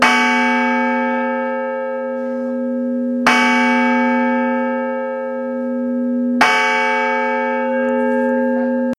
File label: east brother light bell